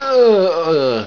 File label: scream; wanted